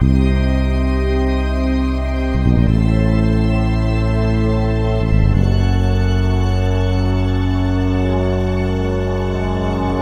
trailer; machiavillain

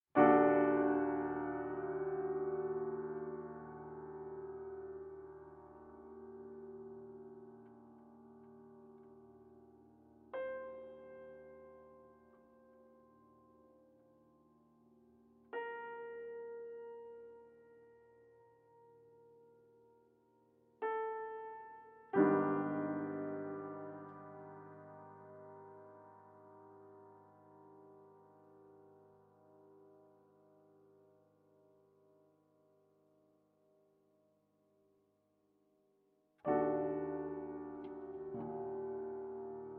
tune

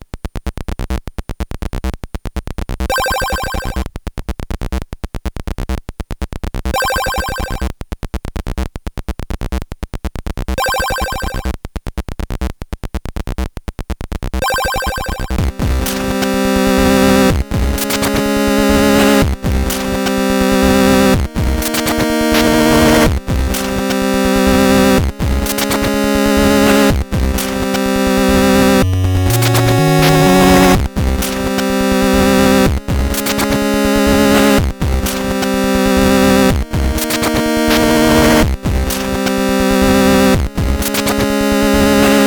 Loading; World 2 Factory

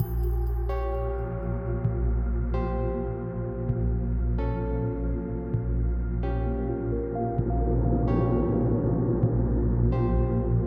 level loop; vikings

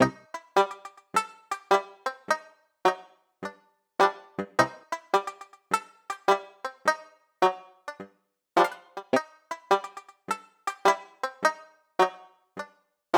banjo

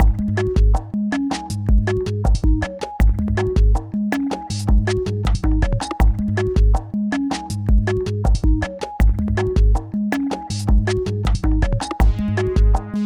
longer loop; pseudOs

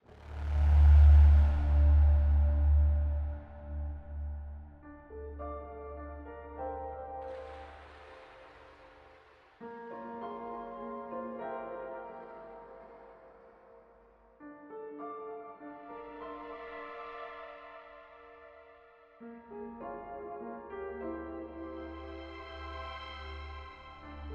piano

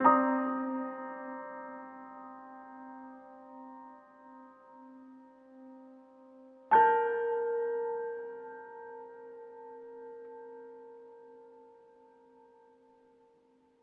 tune

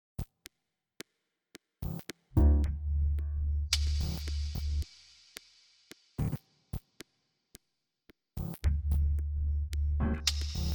piano

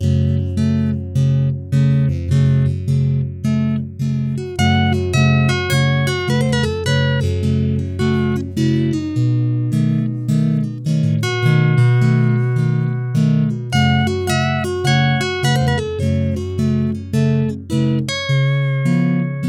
Ballad of the lonely robot (busker version); intradiegetic

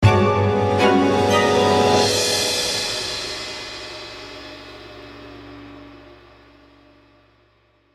transition; Blafardes; Les toits du monde

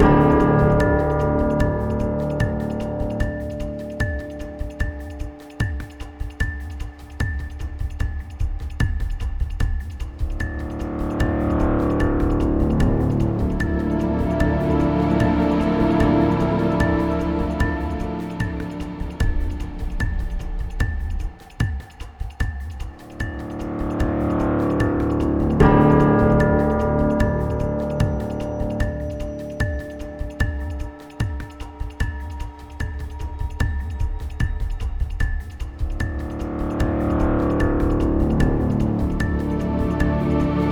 combat

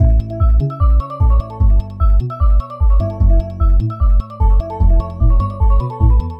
beach loop; Blazer; platformer game

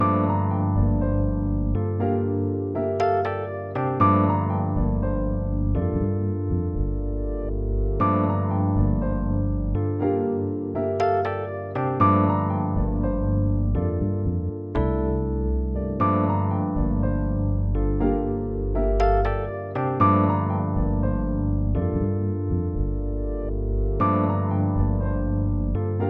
Forest room; ccmixter

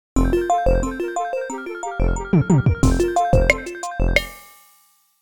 ingame jingle logo; level 22

